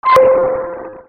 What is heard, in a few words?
SCIFI